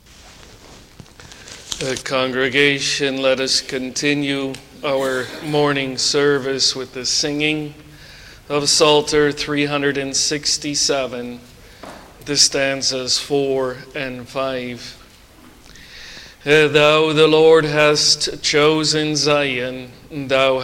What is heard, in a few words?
Singing